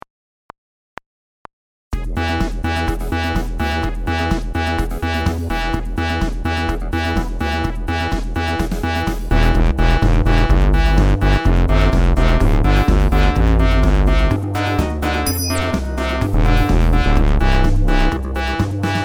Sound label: dubstep